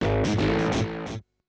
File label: BASS SHOT HOUSE 125 A; eJay; Singleshots